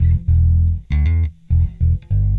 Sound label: Slinky Melodic Gtr, Rock, Guitars